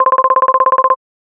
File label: ringer, chirp